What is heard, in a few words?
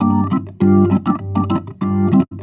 B3 LOOP G